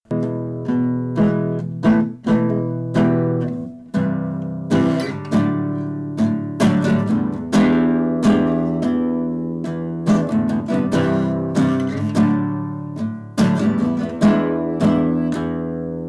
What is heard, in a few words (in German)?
gitarre